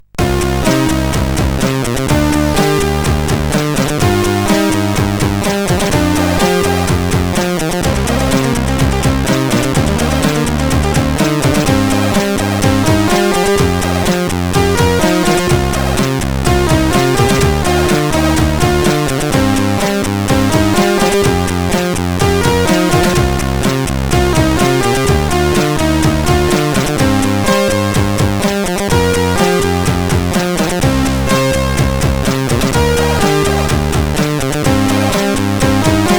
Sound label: Funky Freak remix, CSG8580R5